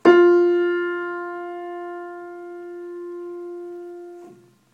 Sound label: Detuned F4